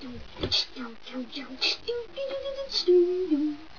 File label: sing; valve; elf